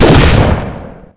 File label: automatic shotgun fire; weapons; autoshotgun